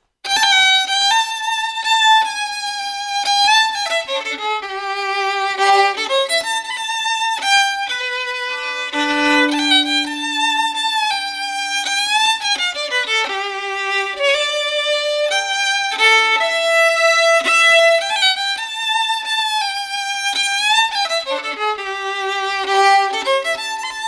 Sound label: waltz fiddle; poor girl waltz; tune tutor; SeamusGrantFestival